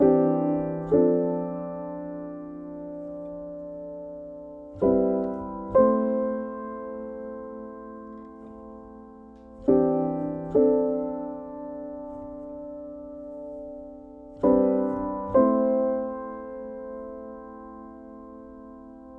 Piano Loop - 100 BPM A Min; Instruments; Cymatics; Light Free Sample Pack; Bonus Samples

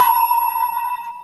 high bell; Cartoon - film effects

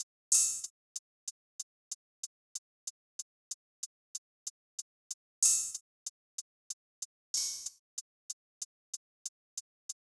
Drum Loop 94 BPM Hihat, Hip Hop; Cymatics; Los Perro

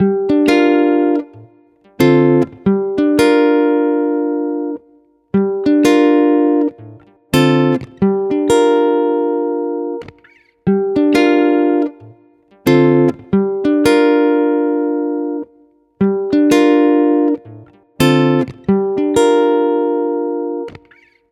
Guitar 90 BPM (Gmaj); Guitar Loops; Harlot; Ghosthack; Guitar Loops & Shots

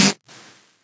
perc oneshot; GhostHack

